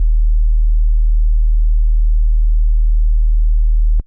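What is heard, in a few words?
Clean Sine DX100 D#0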